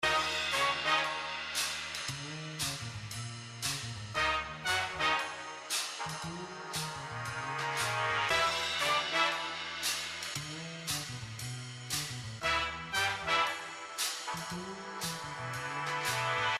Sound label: BGM Paytable; Premier Night